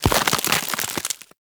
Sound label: Ice Wave Spawn; Corpus; Arachnoid Camper Terra; Abilities